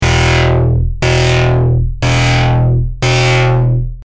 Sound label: lfo tooth